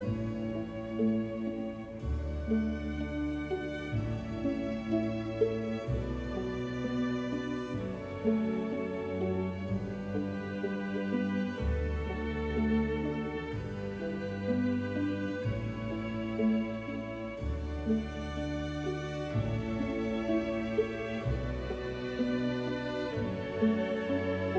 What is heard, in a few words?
Canon en ré majeur ( D major ); Pachelbel